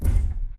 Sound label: footstep jog; Titanfall 2